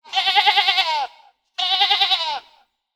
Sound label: sheep bleating young sheep